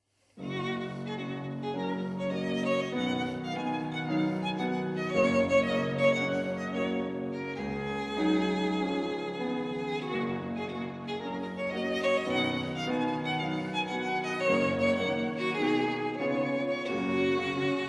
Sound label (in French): Violon